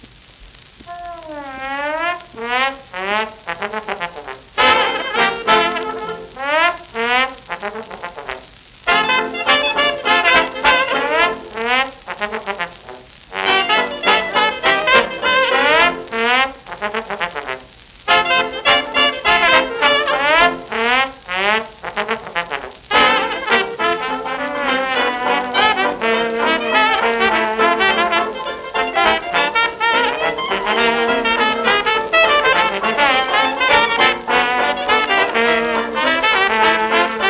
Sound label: creole trombone